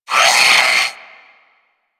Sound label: Sentient Brachiolyst Pain